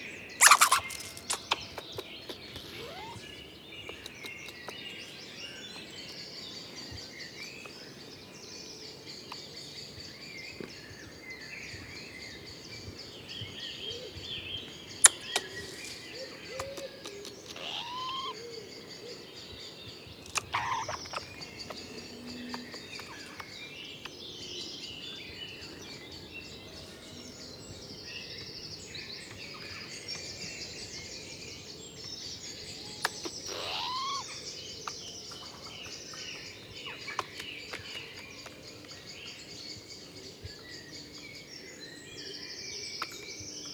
Eurasian Red Squirrel Sciurus vulgaris alarmgeluid eekhoorns